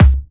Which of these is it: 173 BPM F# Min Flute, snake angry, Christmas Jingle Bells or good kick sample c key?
good kick sample c key